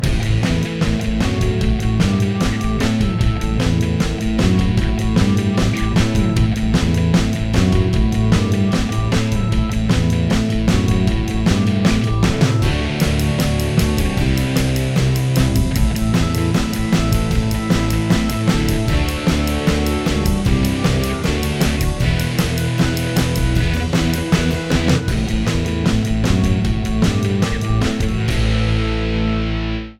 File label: added keys